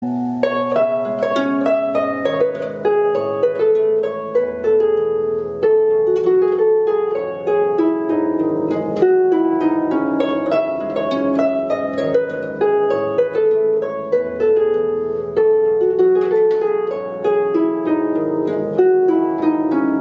Harp

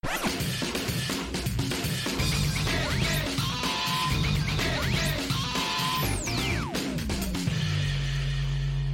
groove

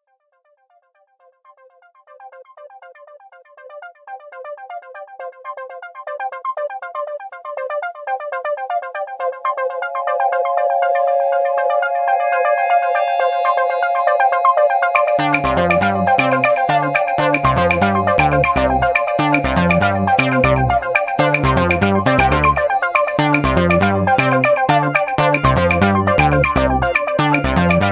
Tweet Tone

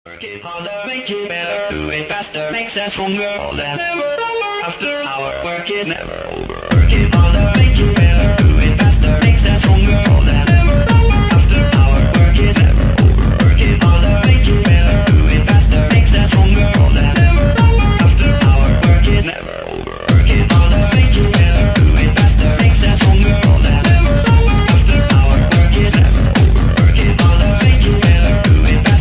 Hardstyle

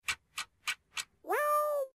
Meow; Downfall

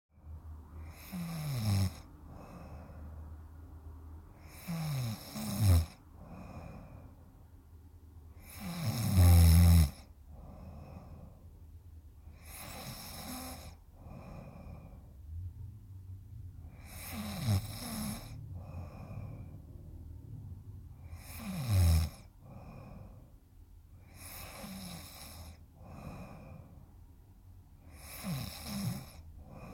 Snore

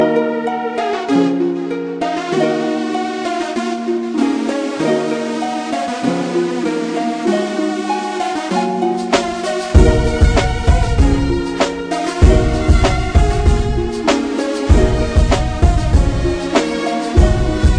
Violin Beat

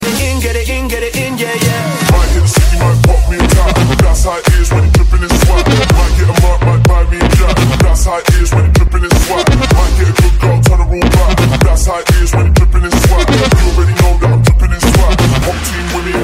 EDM Drop

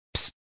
Whisper; Toontown

